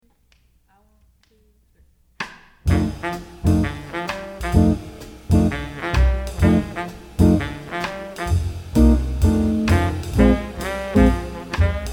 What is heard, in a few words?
Blues guitar; All-State Jazz etude